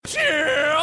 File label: CHIIIIIIP